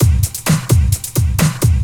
drt 130 electro, DRUMLOOPS, LOOP ELECTRO

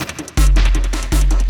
LOOP, Drum & Bass, 160 BPM; Ueberschall; Drum & Bass Resonance

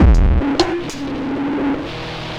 drloop, drumloops, Vinyl Attack; Masterbits

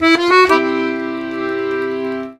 120 GIGUE, Complete Accordions

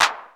Clap, retro house samples, Drum Hits

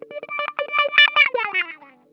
TUMBLE, Funk Guitar; Zero G - Funk Guitar

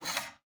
GUITAR FX; Xsample VOL-2

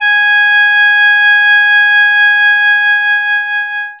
sheng g#4; WORLD INSTR 2; WOODWIND AND BRASS

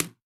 Distrt H, Tom, Yamaha DTXpress-II

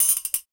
PRC COIL, Perc Toys; Spectrasonics; Supreme Beats; World Dance

